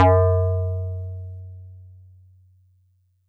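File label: Metal Tube DX100 F sharp 6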